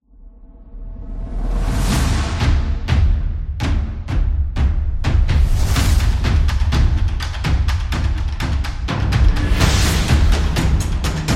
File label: Tribal Drums Cinematic; epic drums